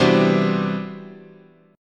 C Major Sharp 11th, guitar chord

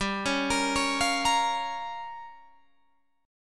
G Minor 7th Flat 5th, Guitar, Open G

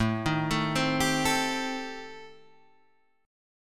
A-Minor 7th Flat 5th, chord; 5,6,7,5,8,5; arpeggiated; Am7b5

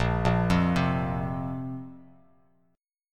A sharp Suspended 2nd down Bass Standard; arpeggiated; A#sus2; JGuitar

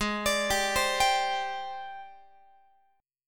G sharp Major 7th Flat 5th G sharp, down, Guitar; arpeggiated; x,16,17,17,16,18; 4A,4E,5A,5D,5G#,6C#; G#M7b5

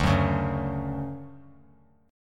D Major 7th, chord; strummed; JGuitar

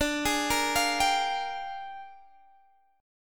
A sharp 13th

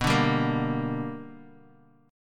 B Minor Major 7th Sharp 5th Bass Standard; strummed; JGuitar

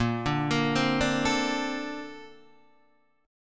Bb Major 9th, Bb, down, Guitar, DADGAD

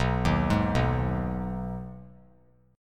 B 6th B down 3C 3F 3A 3D, chord; arpeggiated

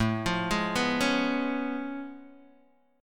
A Augmented Major 9th A down Guitar DADGAD

